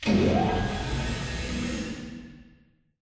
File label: radar tower servo long; Titanfall 2